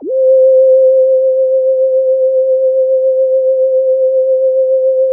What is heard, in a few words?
whistle, loops synth